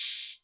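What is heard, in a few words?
Hat Open, Novation Drumstation; Novation DrumstationV1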